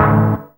Synth Shots; Vengeance Samples

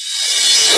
Cymbals Reverse; Vengeance Samples

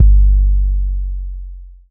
Bass Drop, 808; Street Anthems Sound Kit

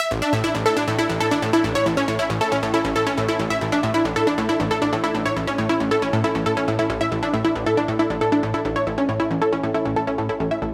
Mainpluck WET, 137BPM, A major; Unlooped

